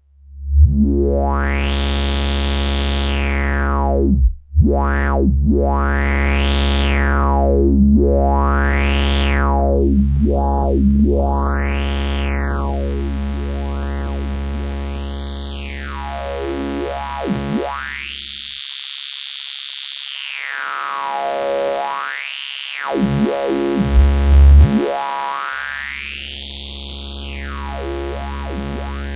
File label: low notch high, modular; A-124 Wasp Filter (VCF 5); Low Pass 〜 Notch Pass 〜 High Pass Filterの連続可変 (波形はA-111矩形波を使用)